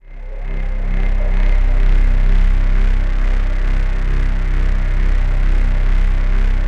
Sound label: ATMOPAD; Best Service; XX Large; Pads